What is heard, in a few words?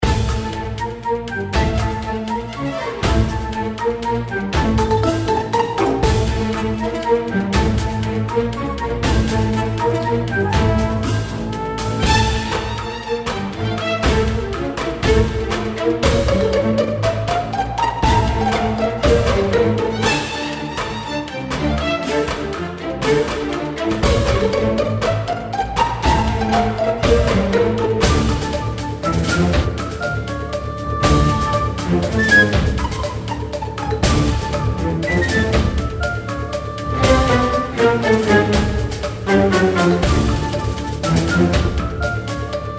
music battle; Strikeforce Kitty